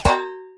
metal, hit; tacz-tweak-pack; taczmechanics

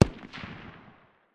dmr far distance, 7.62x51mm; tacz-tweak-pack; taczmechanics

